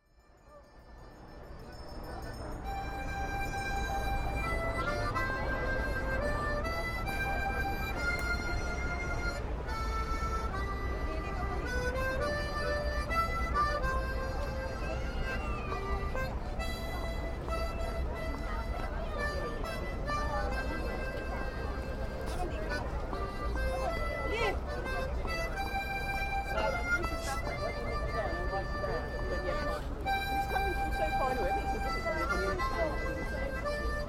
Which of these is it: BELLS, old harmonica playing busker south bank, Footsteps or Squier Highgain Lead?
old harmonica playing busker south bank